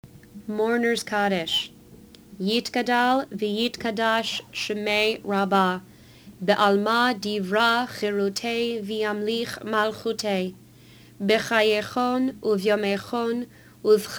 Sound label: Cantor Mourners Kaddish